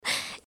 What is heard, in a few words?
breath; pony; Twilight Sparkle